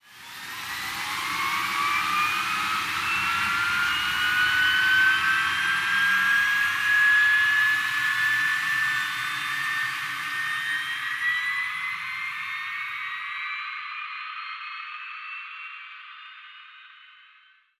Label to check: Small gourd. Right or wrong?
Wrong